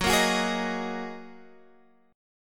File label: A sharp 7th Suspended 4th Sharp 5th F sharp